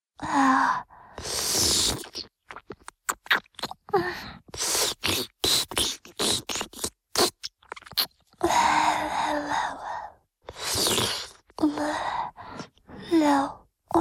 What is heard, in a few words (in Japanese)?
フェラチオ(弱):亀頭を舐める, チュパ音; チュパ音抜粋集